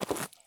stop slate; Escape from Tarkov

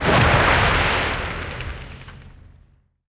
12mm tails far

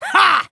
taunt hah

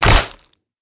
fall damage; Rust

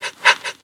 dog pant

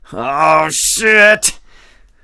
hurt neardeath; Escape from Tarkov

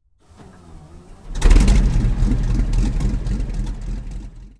cromwell engine start

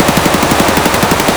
vityaz indoor close loop; Escape from Tarkov

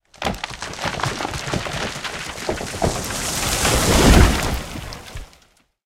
tree fall

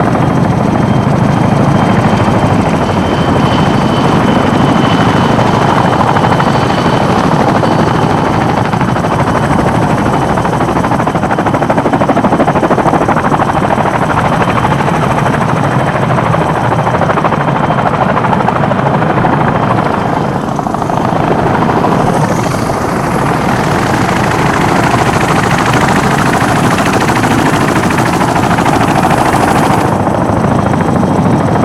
seaknight move, vehicles; Call of Duty Modern Warfare

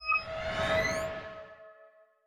bonus; War Thunder

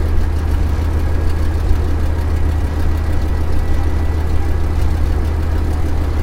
griffon cockpit, engines; War Thunder

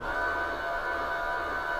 P-51D cockpit primer, aircraft; DCS

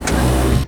biomech walk engine

